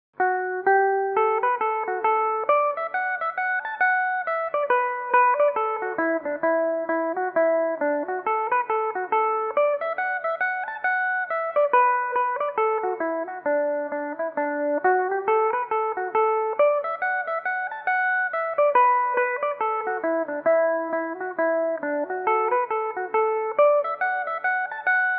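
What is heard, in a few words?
D major, Irish mandolin; bonaparte crossing the rhine; belmuse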